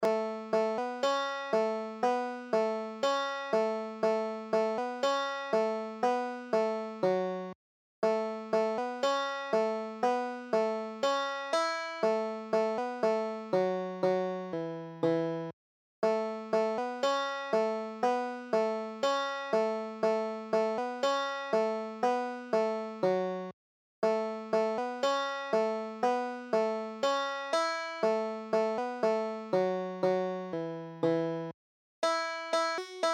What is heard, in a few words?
britches full of stitches, banjo